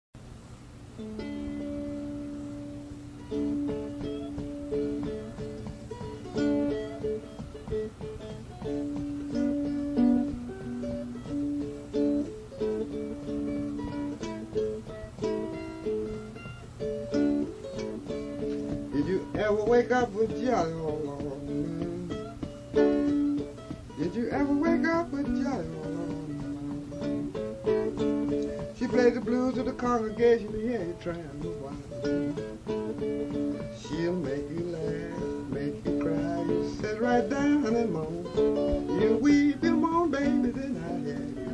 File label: Rocking Chair Blues